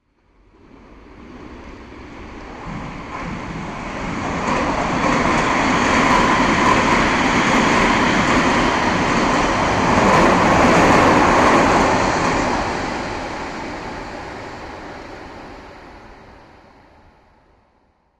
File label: subway train